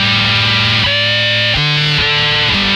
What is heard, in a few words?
JUMBO LOOP, Funk Guitar; Zero G - Funk Guitar